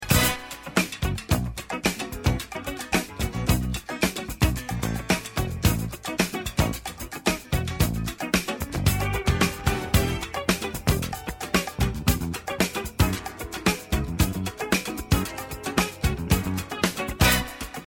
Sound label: Bass